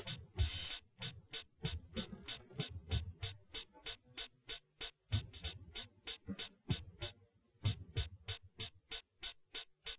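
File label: with drums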